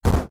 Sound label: Damage